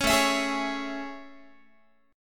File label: A Major 7th A, Guitar; AM7 strummed